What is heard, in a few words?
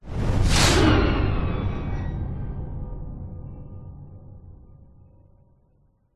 sound design cinematic hit whoosh whizz by long tail; zapsplat